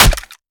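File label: melee character gun medium steel; viper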